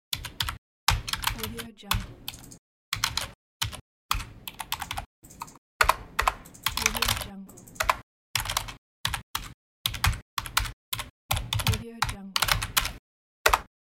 keyboard typing and mouse clicks

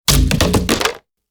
heavy wooden sheet dropping; sound ideas

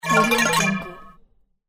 cartoon notification warning sign

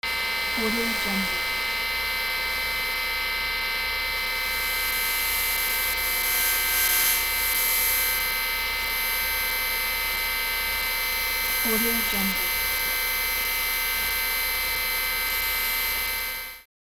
noise FM synthesis; soundmasterfx

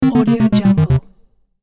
game over sound

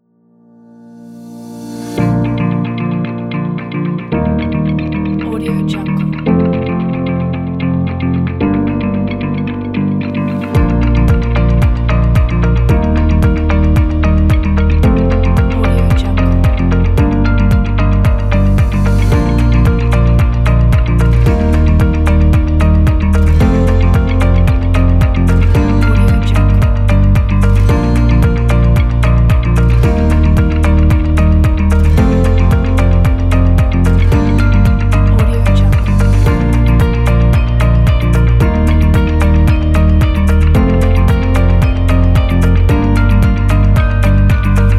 uplifting corporate background

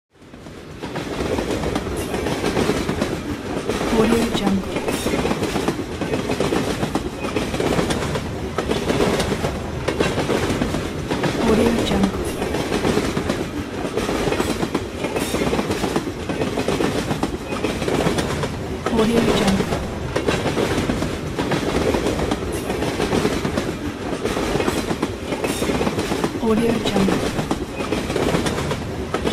train

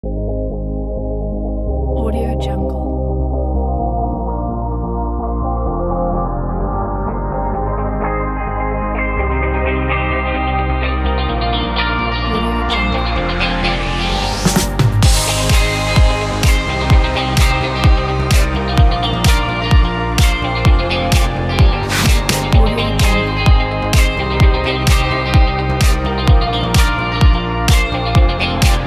dance pop; tanenmusic

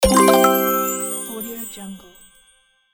game win

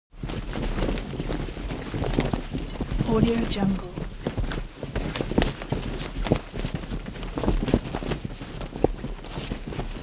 transportation sailboat sail flap

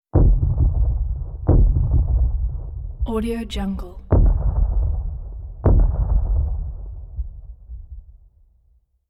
boomy footsteps giant thumpy dinosaur footsteps; prosoundfx

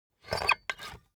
object office puncher squeak punch bulky slow; smartsoundfx

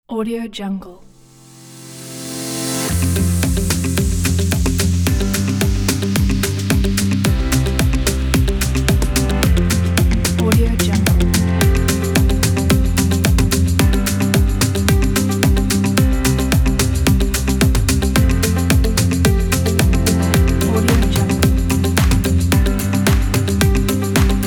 traveling

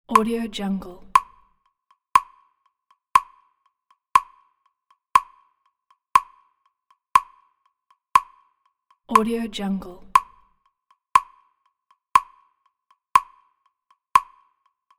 metronome

